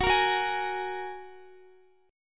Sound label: Gb Major Add 9th, down, Guitar